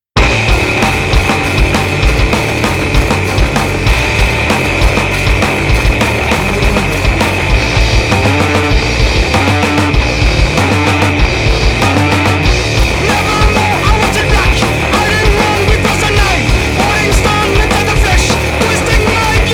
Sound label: Crystal Distortion